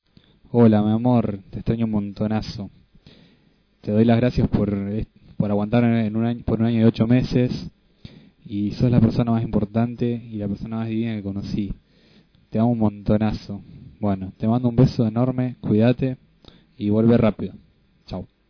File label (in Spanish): feliz aniversario